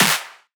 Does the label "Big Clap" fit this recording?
Yes